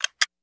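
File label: Camera Double Click; shutters & movement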